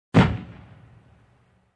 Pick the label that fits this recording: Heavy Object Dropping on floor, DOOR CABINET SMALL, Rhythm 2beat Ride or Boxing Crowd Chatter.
Heavy Object Dropping on floor